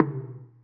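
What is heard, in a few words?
TOM soft; Zero-G; Chemical Beats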